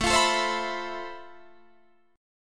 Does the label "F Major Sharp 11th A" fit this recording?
Yes